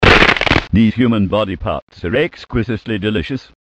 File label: headcrab bite